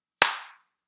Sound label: clap